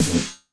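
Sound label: FX ROOM Snare 909; Masterbits - Soniq Elements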